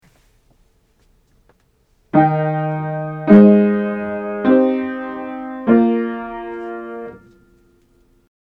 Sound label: Part Writing Root Position Triads Aural Training exercise